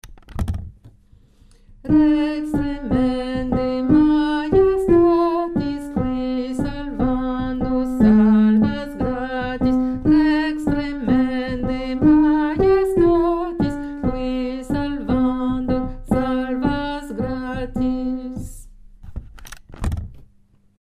numero 4 lettre G alto

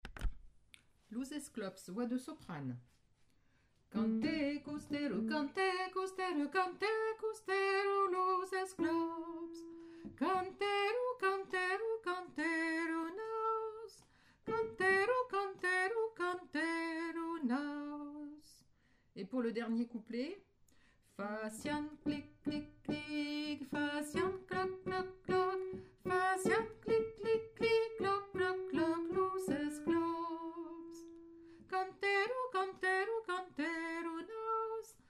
los esclops soprano

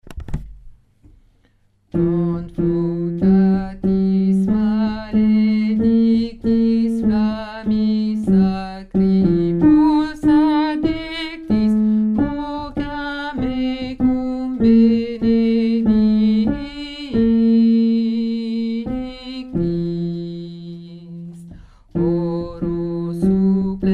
basse

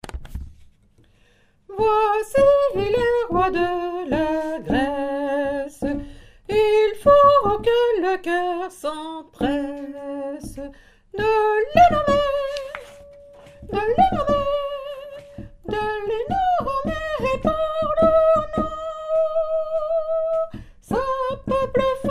soprano, chorale

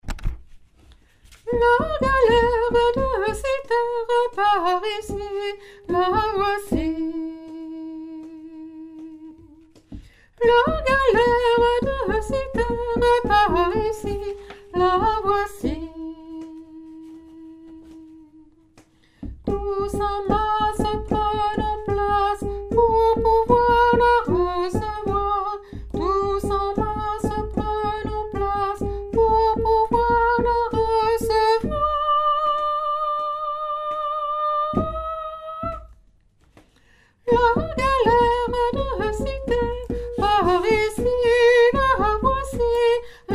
couplet tenor, chorale

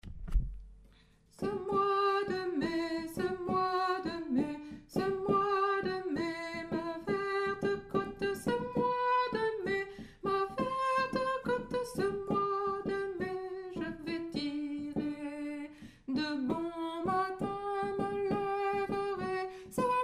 Ce moy de mai Alto

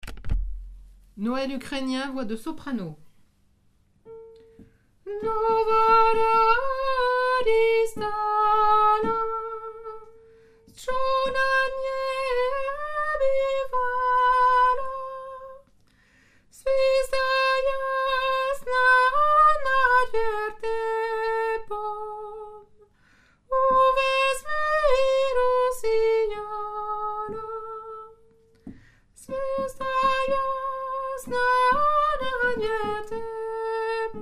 soprano; noel ukrainien

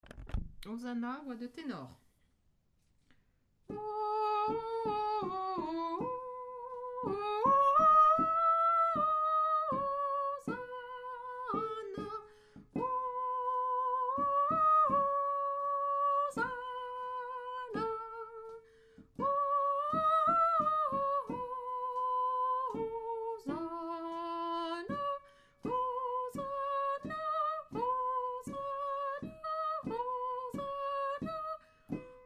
tenor

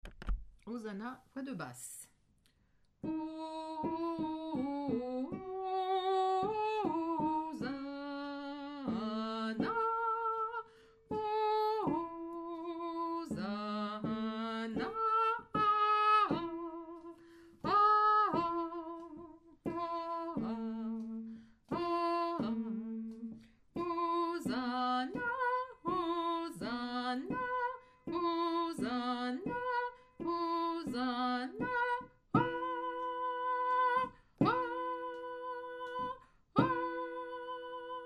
hosanna basse; repetition agen septembre 2024

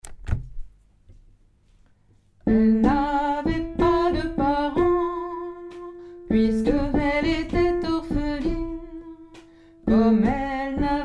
les crayons soprano

